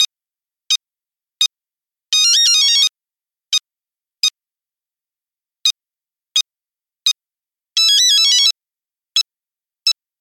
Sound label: Tick tick; Nokia; Noa Nakai; Monophonic